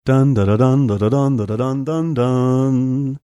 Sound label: singing somber music bumper